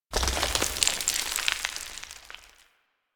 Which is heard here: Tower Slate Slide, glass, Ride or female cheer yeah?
Tower Slate Slide